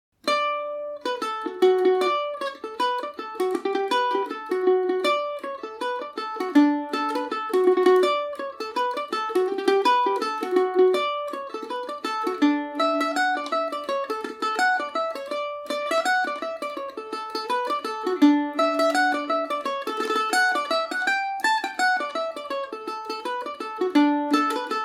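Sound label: D major paris swing, irish mandolin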